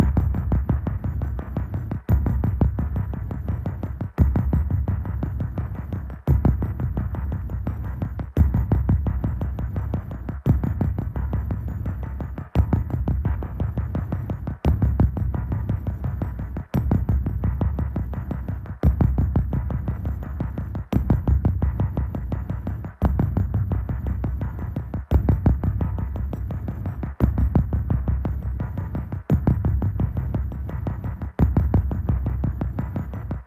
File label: 86bpm Layer